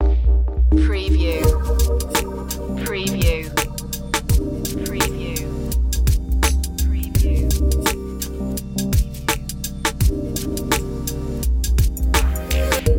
abstract vibes, chill

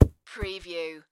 Sports Soccer Football Ball Drop On Grass

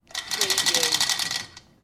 Chain Through Pulley Medium Speed

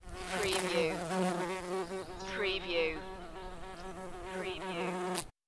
Bumble Bee Buzzing

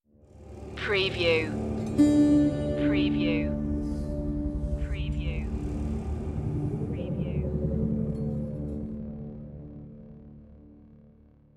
anguish stinger

